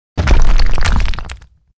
die; BOSS GATE

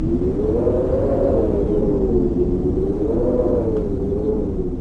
wind no leaf; EnvSound

